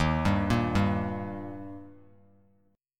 D sharp Major D sharp Bass Standard, chord; arpeggiated; 11,10,8,0; JGuitar

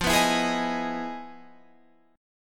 E Major 7th F; strummed; Emaj7/F; JGuitar

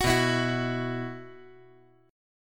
B Suspended 4th

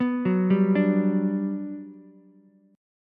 G Major 7th, Ukulele; arpeggiated; JGuitar

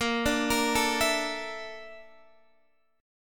Bb Major Sharp 11th Bb down Guitar DADGAD, chord

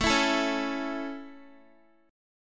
A Major A, Guitar; strummed; x,12,14,14,13,x

